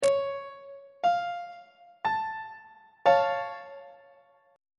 g double sharp augmented 1st inversion on treble clef